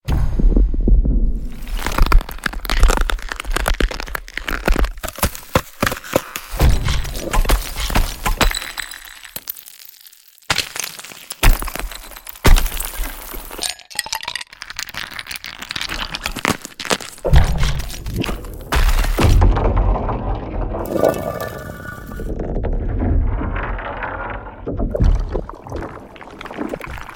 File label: Glacier Ice